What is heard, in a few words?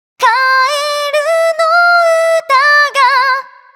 cute